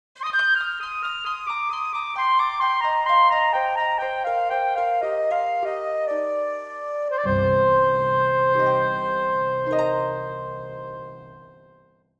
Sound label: Game over you lose fantasy